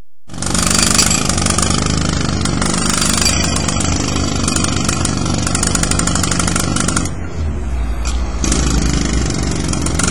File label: Road Drill